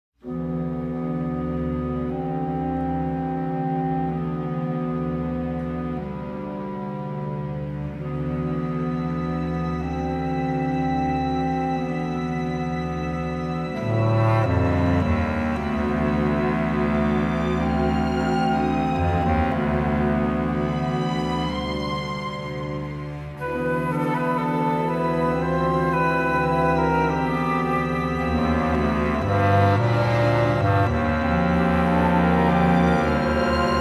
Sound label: Menu Music